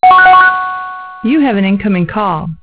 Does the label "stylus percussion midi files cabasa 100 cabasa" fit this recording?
No